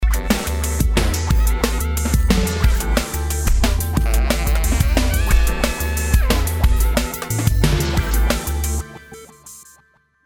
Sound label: Sax; Oppsite